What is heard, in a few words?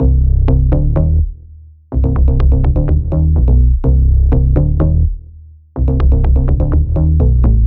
Bass Night Shuffle Cm 125Bpm, Techno; Bass Loops; Ghosthack Advent Calendar - Day 6 - Techno Kit